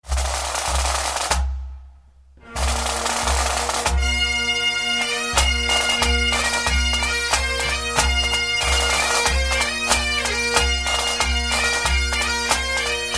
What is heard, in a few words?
Bagpipers